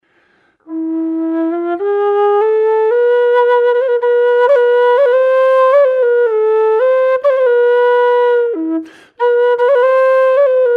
low E theme phrygian dominant

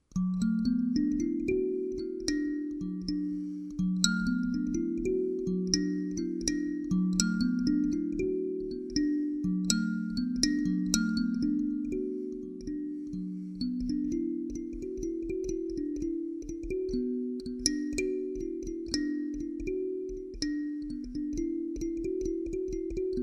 D major pentatonic impro, Kalimba Improvisations

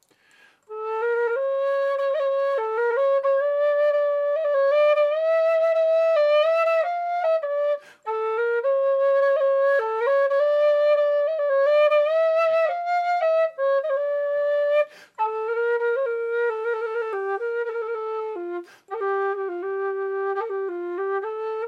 G gypsy impro; Whistles; Gypsy - Flamenco - Arabic - Klezmer - Blues Whistles

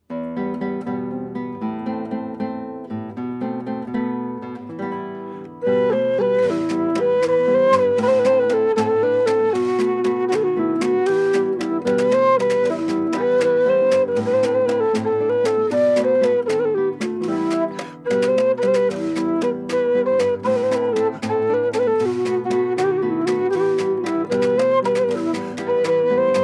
Tarantella; Tarantella 2

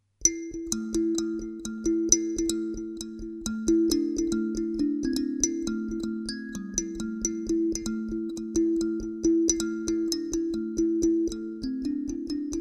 A minor pentatonic impro, Kalimba

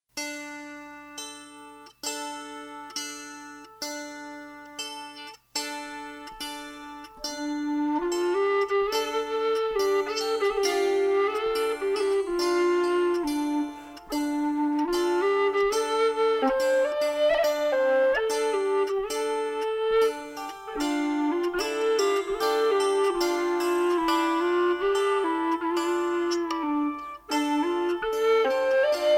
Kantele Whistle Mix

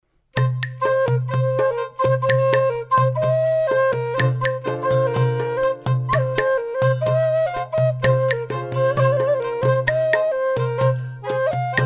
Samba Bossa Nova

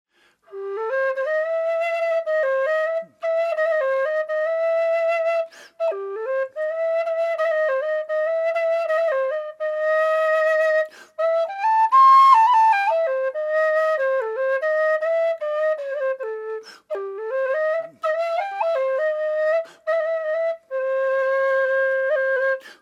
gypsy impro; Whistles; Gypsy - Flamenco - Arabic - Klezmer - Blues Whistles

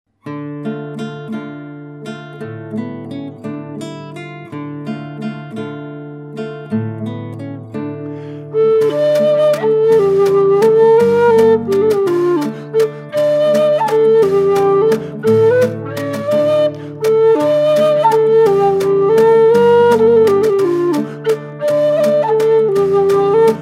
Tarantella; Tarantella 3

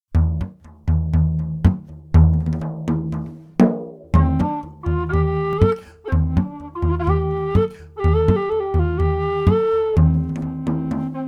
Whistle and Drum

